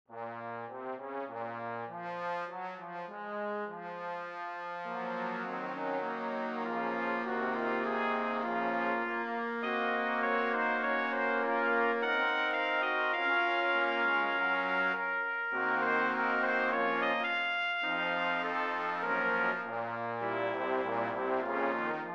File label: Brass Quintet; Ewald